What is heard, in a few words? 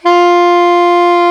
soprano sax F 65; Northstar - New Gold VOL-1